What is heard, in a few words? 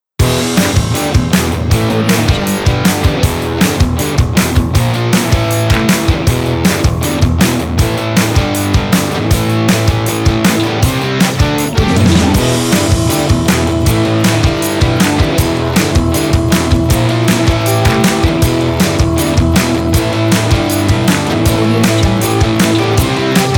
retro rock